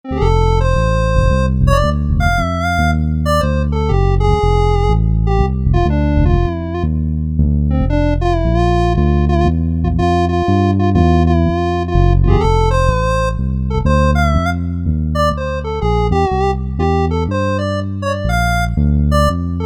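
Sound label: Harp + Bass, Korg MSC-9S Organ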